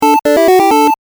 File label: Item Get! (8-bit); Lenna's Inception